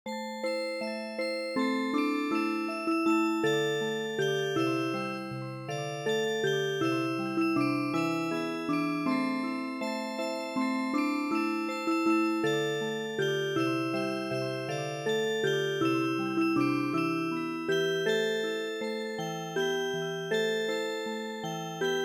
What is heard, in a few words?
Music Box, traditional; El Pajarillo